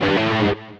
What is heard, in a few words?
Electric Guitar; heartslash